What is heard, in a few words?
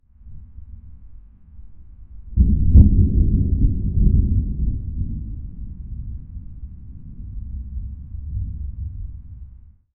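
continuous distant thunder, nature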